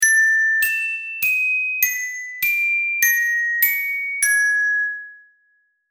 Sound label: Text Tone